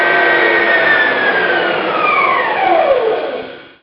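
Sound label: Compressor End, Train; OpenBVE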